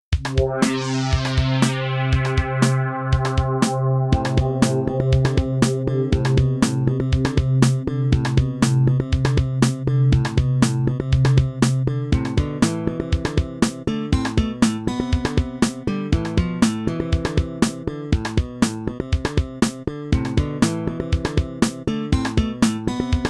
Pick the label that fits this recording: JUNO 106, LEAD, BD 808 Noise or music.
music